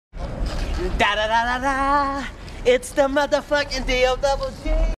bike

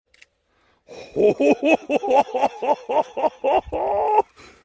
hohohoh

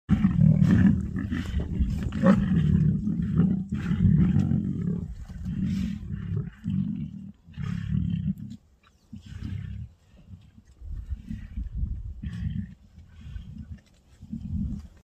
lion growl

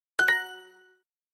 duolingo corect fast

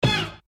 spray; jet set radio

